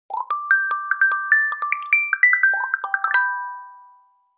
Google Pixel 4 Crackle

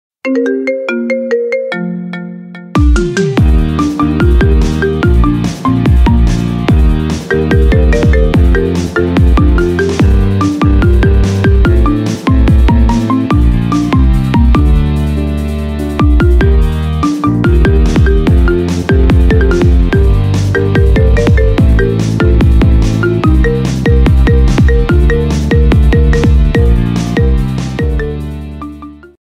Marimba

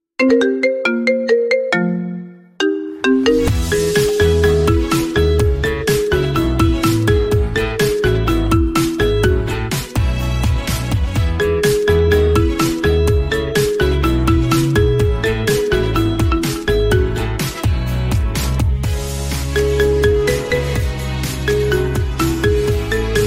Marimba

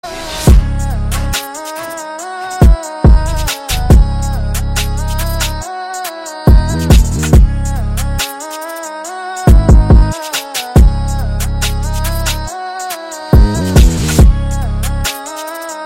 Latin Mix

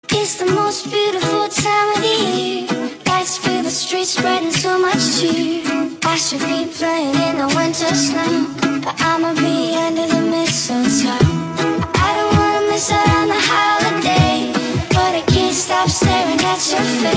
Ding Dong Christmas

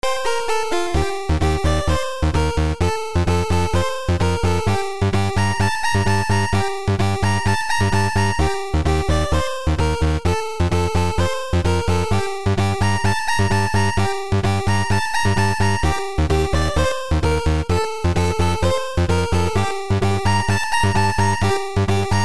8 Bit Remix, tono de llamada

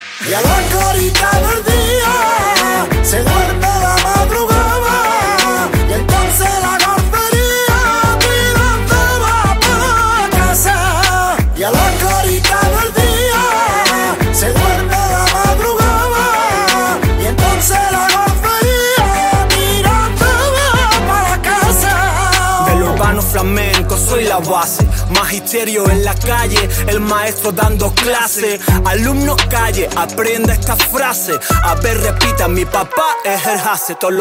opel kadett

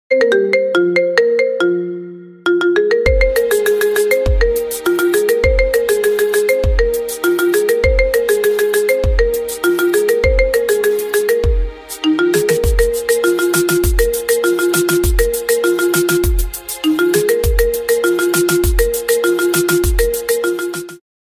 Mercy Marimba

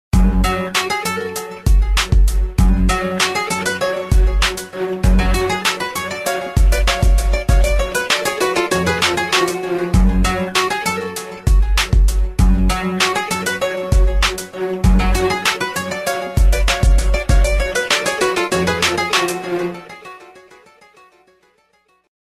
Samsung Galaxy S4 i9500, tono de llamada

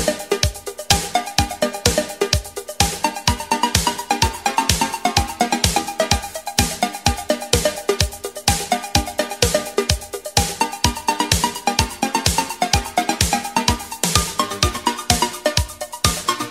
Samsung Galaxy J7, tono de llamada

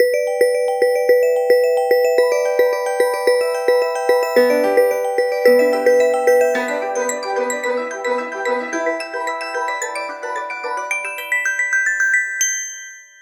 Huawei P Smart, tono de llamada

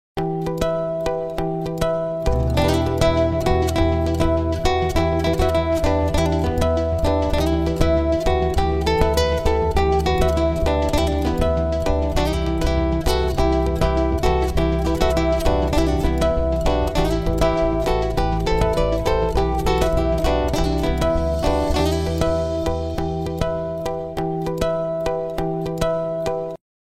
Walton Mobile Phone Original, tono de llamada

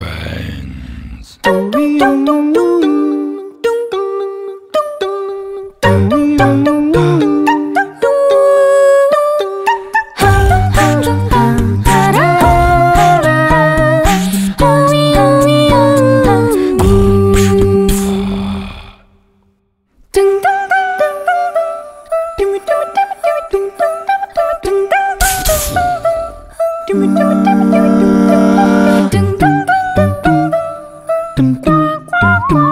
Plants vs Zombies Acapella

